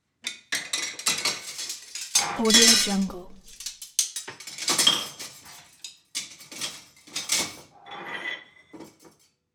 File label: kitchen chaos utensils; collanderson